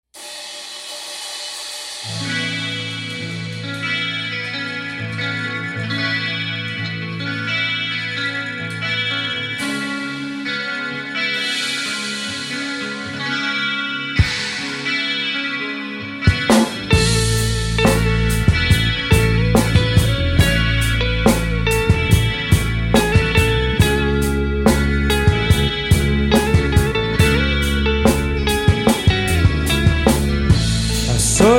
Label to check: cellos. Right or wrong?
Right